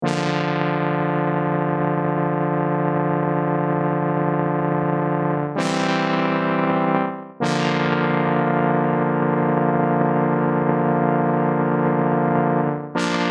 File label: JX3P House Baby Pad dry